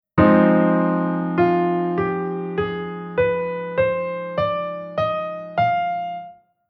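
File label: Chord Scale Minor Harmonic Minor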